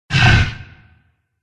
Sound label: dragon red wound